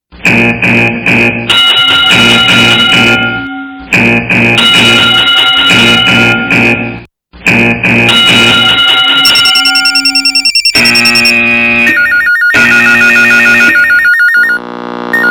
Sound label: extra loud ringtone